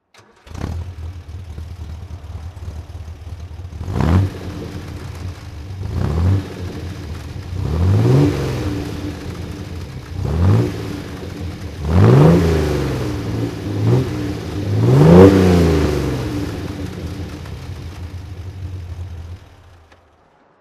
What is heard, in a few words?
austin healey 3000 mk3 throttle